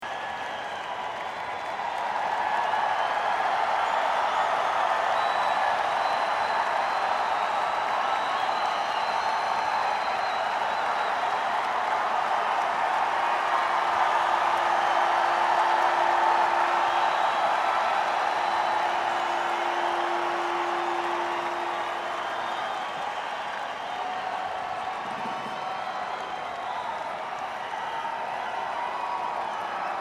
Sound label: Crowd Noise; Worcester, MA - Worcester Centrum Centre